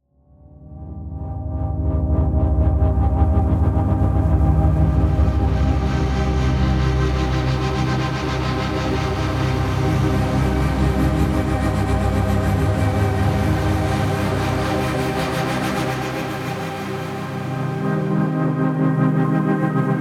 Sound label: sustaining a C minor chord in two octaves; preset examples played very simply by sustaining a C minor chord in two octaves for demonstration purposes; vocal samples; Void & Vista; Kontakt; Highlights/Tired Horses